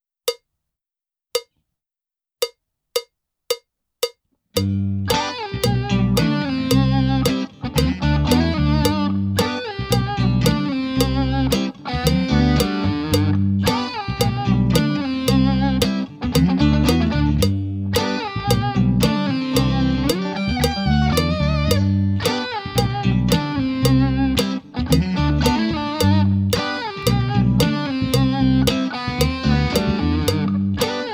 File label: Toe Tapper Backing Track